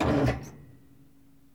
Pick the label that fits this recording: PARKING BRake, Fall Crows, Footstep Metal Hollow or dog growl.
PARKING BRake